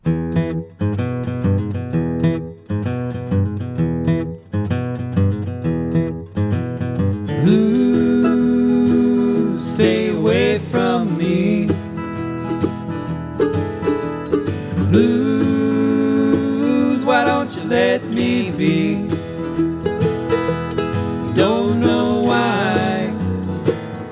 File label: Blues stay